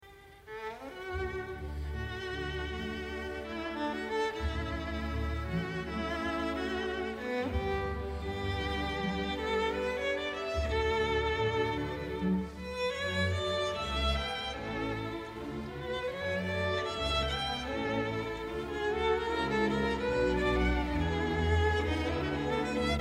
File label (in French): concerto violon; tchaikovski; postromantisme